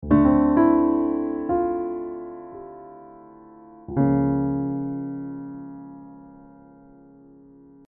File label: Level 2 Victory; chirpy reverie online gamerip 2021